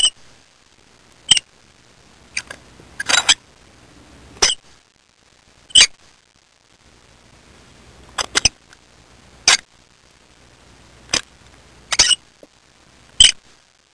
Various Hinge Squeaks

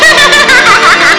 wicked laugh beep

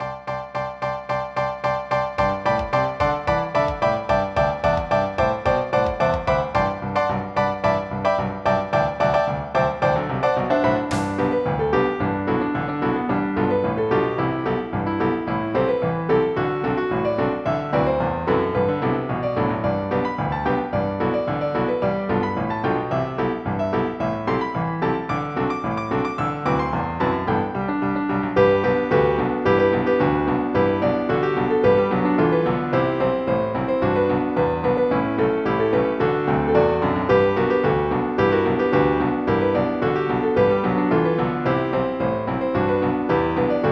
jingle; EuroCD 3

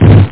walk

